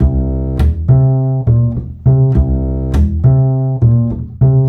Acoustic Bass

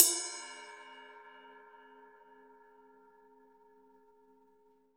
Drums Acoustic, RAW KIT; USB Soundscan; AKAI

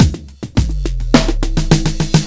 philter beat (fill); Sonic Foundry (Sony Creative Software); Ugly Remnants VOL-2

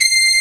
Brass C6; Proteus 2000; Multisamples; Digital Sound Factory Proteus 2000

